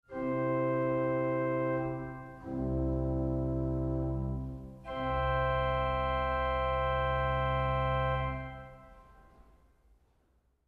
Hohlfloete 8

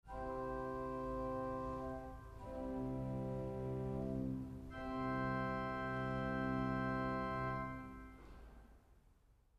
Salicional 8

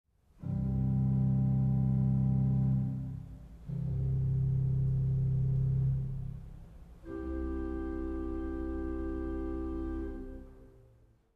Bordun

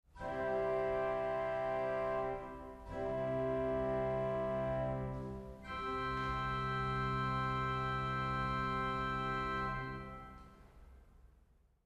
Viola di Gamba 8

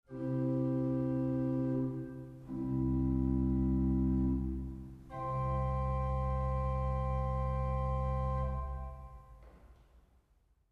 Gedeckt 8

